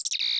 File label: commbadge, bajoran, technology